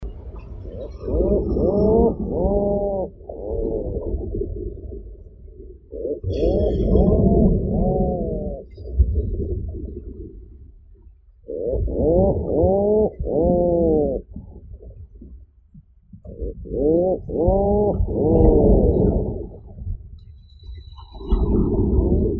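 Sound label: horned puffin, Fratercula corniculata; ipatka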